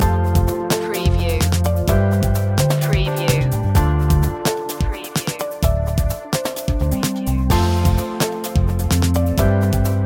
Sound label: broken beats loop, chill